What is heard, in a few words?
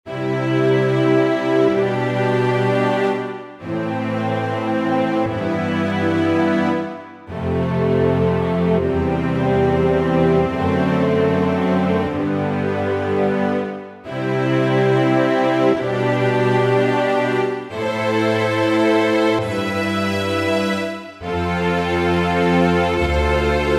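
orchestra; cms weihnachtskalender 2024 freeware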